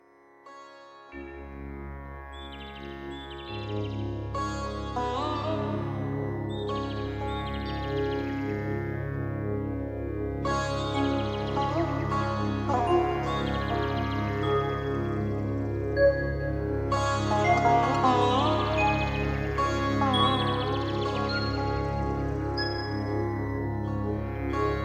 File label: chants